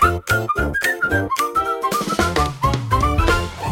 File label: jingle funny; 効果音・ジングル(ショートBGM)